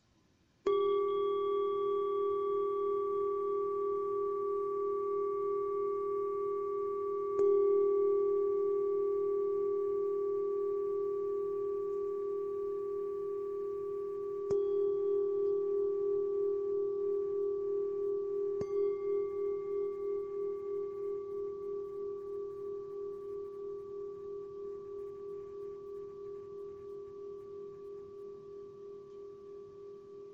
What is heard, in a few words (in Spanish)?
sol 403hz, cuencos tibetanos